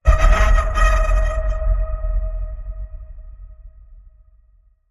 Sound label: Afterlife Phantom Scrape